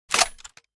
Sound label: m79 open; tacz; daffas arsenal